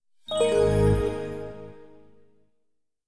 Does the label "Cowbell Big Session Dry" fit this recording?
No